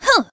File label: punch hoo; pauline; SM64 CO-OP